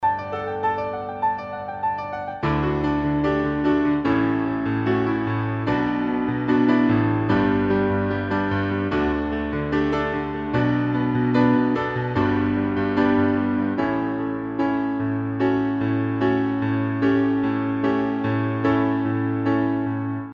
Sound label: Piano Melodies